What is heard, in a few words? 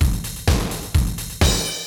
drum fill